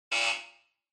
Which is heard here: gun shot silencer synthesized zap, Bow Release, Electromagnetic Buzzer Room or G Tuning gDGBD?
Electromagnetic Buzzer Room